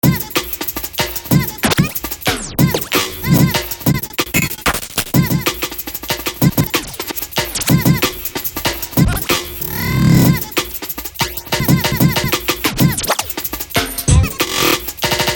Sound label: grossbeat